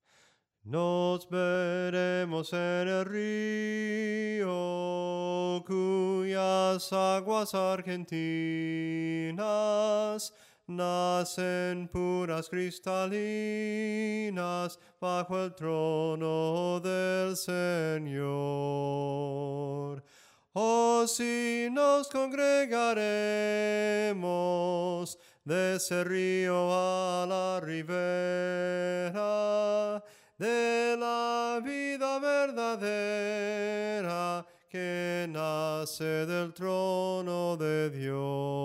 SOPRANO CANTADO; NOS VEREMOS EN EL RIO

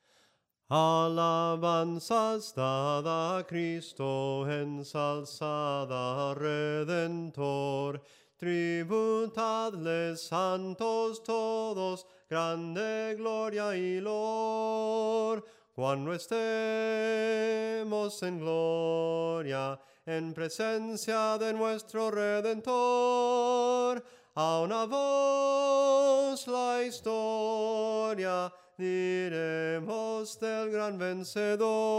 SOPRANO CANTADO, himnos en melodia soprano

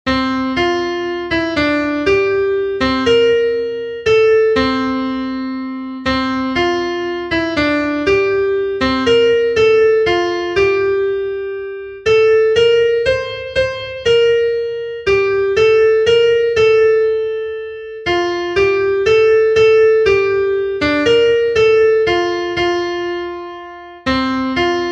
SOPRANO, himnos en piano; cantos del camino

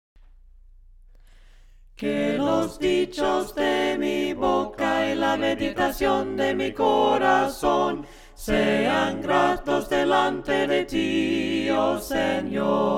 QUE LOS DICHOS DE MI BOCA VOCES

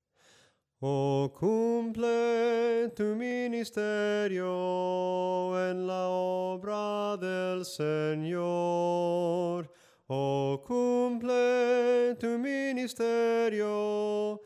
SOPRANO CANTADO; OH CUMPLE TU MINISTERIO

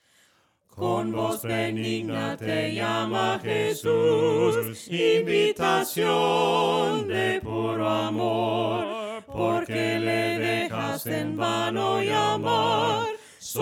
VOCES, himnos a capella; cantos del camino; CON VOZ BENIGNA

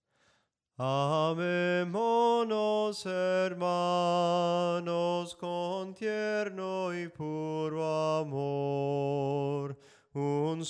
SOPRANO CANTADO, himnos en melodia soprano; cantos del camino

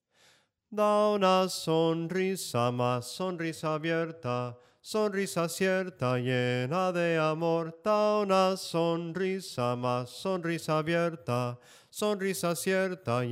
soprano cantado; cantos del camino

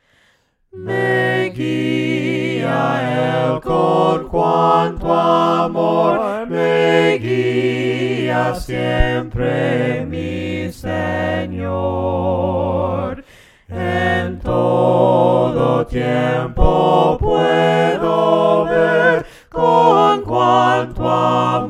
ME GUIA EL VOCES, himnos a capella; cantos del camino

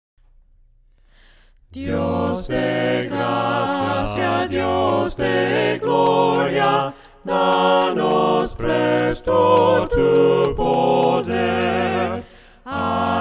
VOCES; DIOS DE GRACIA DIOS DE GLORIA

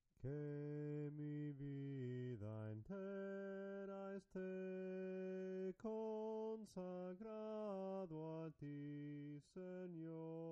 SOPRANO CANTADO, himnos en melodia soprano

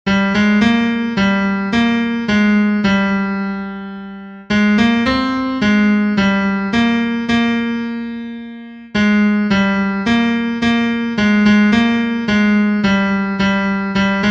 TENOR, himnos en piano; cantos del camino

